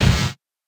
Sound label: Electro Bolt Hit